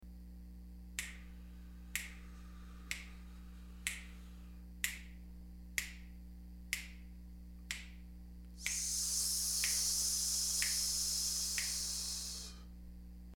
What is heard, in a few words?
Square Hiss